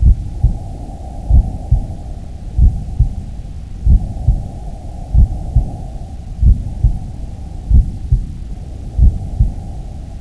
vision

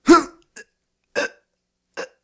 death; police

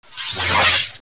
field off

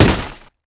hit wood

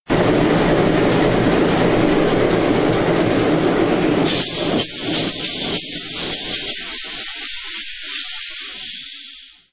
diesel

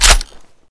assault reload, weapons

